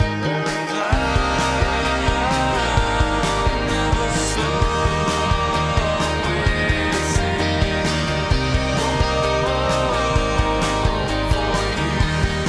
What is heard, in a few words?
texture pad out